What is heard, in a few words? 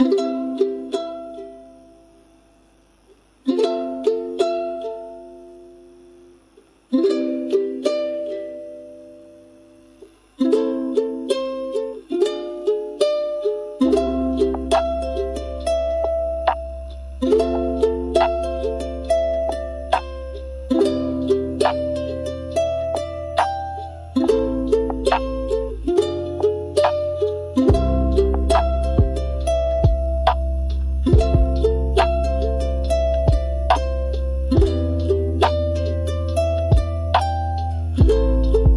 Raindrops on Strings; loopform